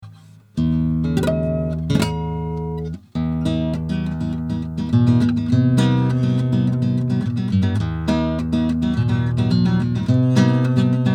AMS Neve 8801 M 500 Nylonstring

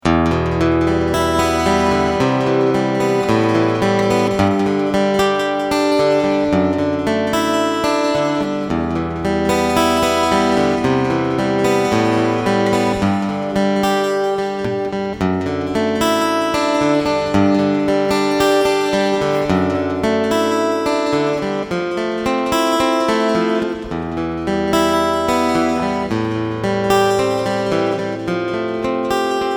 ENGL A101 Delay

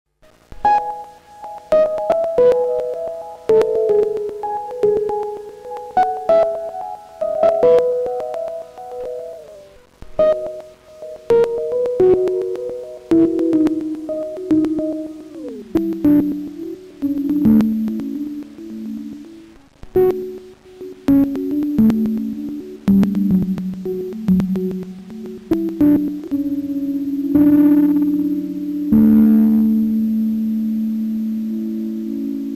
Piano Sample; Bastl Microgranny 2; Monolith

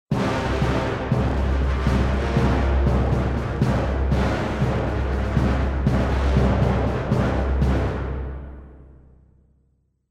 Orchestral Rhythms Evil Battle Basic 8ths 7 8

